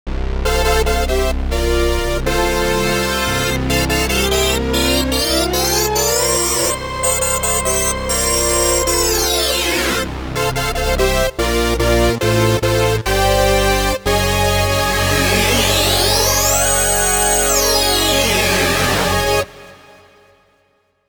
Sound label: OB Power Syn Brass; Yamaha Montage M